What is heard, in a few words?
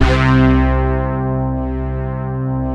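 MOOG BASS C2; USB Soundscan vol.49; Analog Fantasies; AKAI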